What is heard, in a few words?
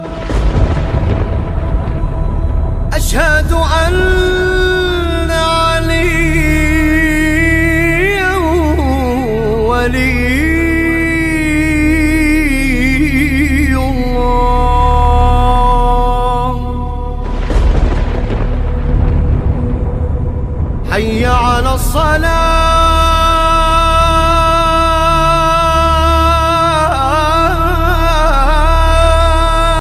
SHIA AZAN RINGTONE